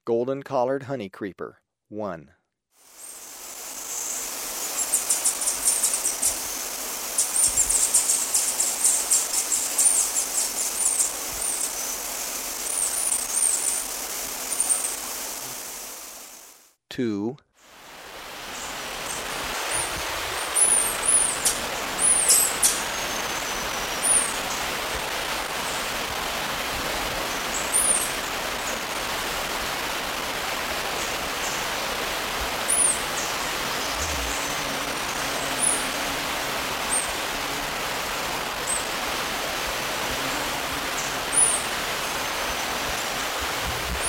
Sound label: Golden-Collared Honeycreeper, Birds of Ecuador; S. America